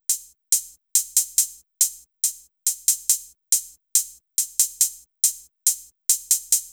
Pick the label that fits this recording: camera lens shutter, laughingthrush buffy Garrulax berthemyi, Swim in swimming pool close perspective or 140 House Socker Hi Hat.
140 House Socker Hi Hat